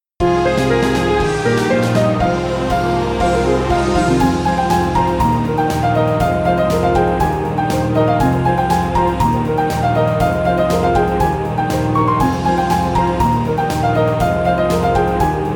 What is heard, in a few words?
Battle Jingle